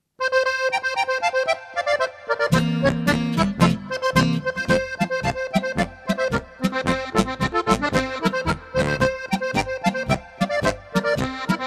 polka

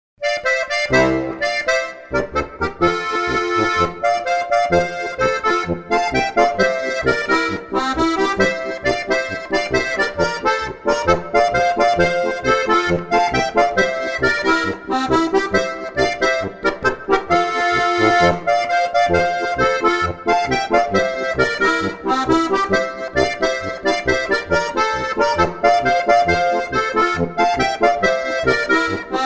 paunzen polka

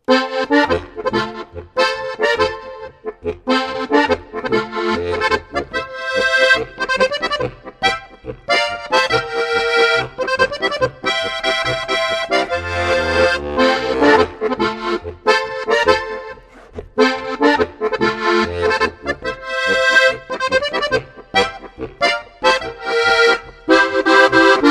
polka

